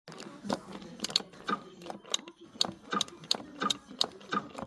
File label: arcade sound